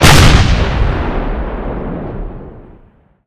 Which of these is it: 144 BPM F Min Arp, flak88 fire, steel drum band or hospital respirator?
flak88 fire